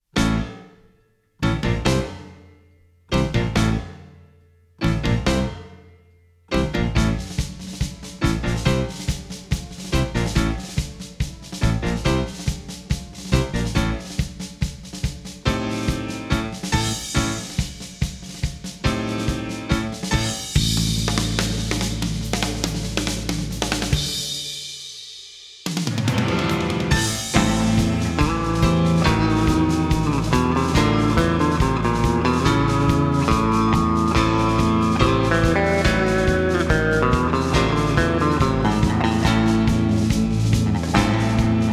rhythm only; Neon Genesis Evangelion; Evangelion S2 Works

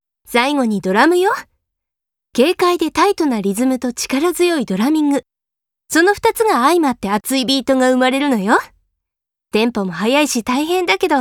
Drum Introduction; K-ON

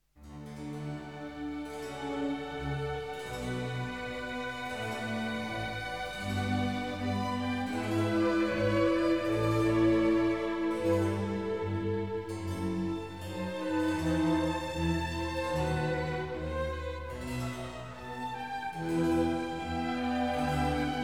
Orchestra Suite No. 3 in D major air; Bach; Legend of Galactic Heroes; Galactic Empire SIDE

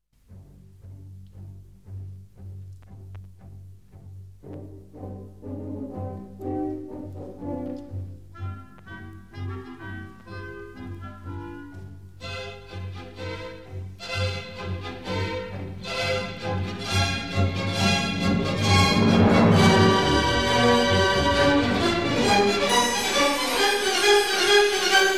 Cello Concerto; Cello Concerto No. 3 (Dvorak); Legend of Galactic Heroes; Galactic Empire SIDE

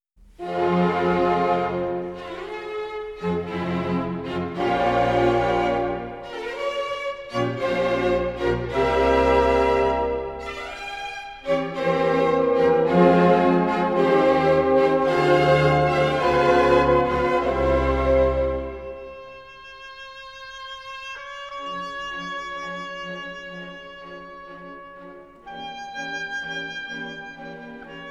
oboe and orchestra; intro for the subject and play a variation (Hummel); Legend of Galactic Heroes; Galactic Empire SIDE

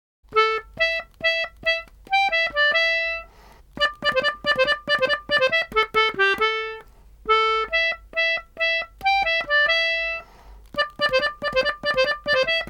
Accordion Version; Ojamajo Doremi

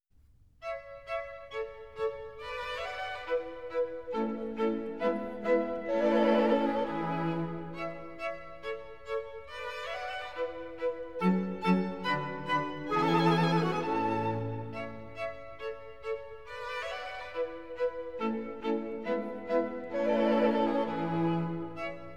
Clarinet Quintet fourth movement; Mozart; Legend of Galactic Heroes; Galactic Empire SIDE

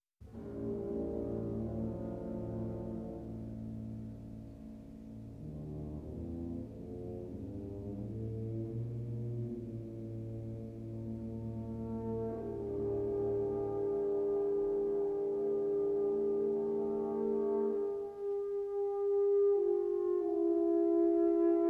Symphony No. 4 fourth movement; Brahms; Legend of Galactic Heroes; Galactic Empire SIDE

